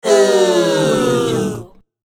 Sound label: wrong answer oh vocal